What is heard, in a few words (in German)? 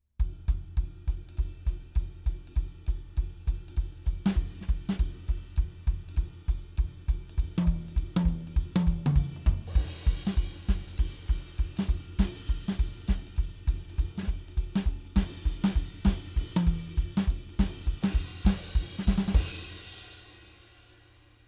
jazz klangbeispiel; Presonus DM-7